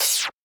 cymbal

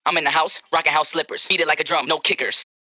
vox

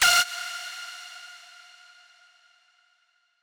lead

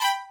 violin

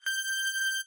lead

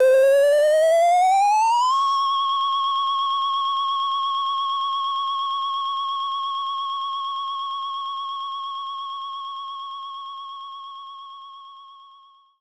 RESULT GAUGE UP; maimai

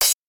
cym

